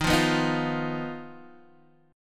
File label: D sharp Suspended 2nd Sharp 5th D sharp, Guitar Open D; x,6,3,5,6,3; chordshape